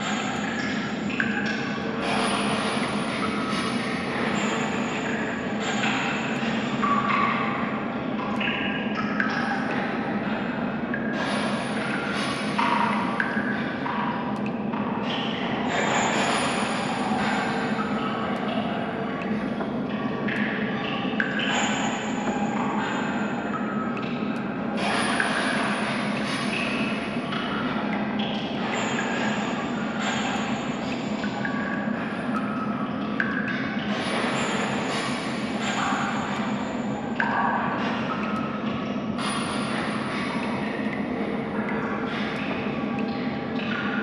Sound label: Dungeon Ambience; Blastwave FX